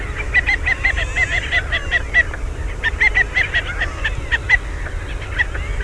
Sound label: Aythya ferina